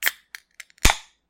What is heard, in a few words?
beer open, bier offnen